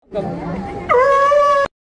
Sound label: seal screaming; vine